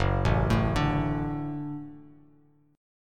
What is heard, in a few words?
F sharp Minor Sharp 5th F sharp Bass Standard; arpeggiated; JGuitar